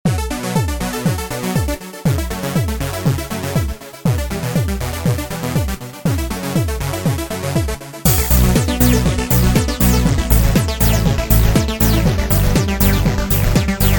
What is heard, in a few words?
dance music